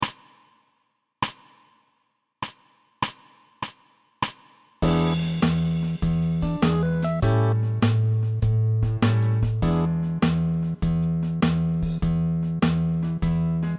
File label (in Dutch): blues bas